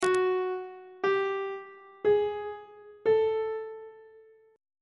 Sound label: g flat 2nd interval pitches on treble clef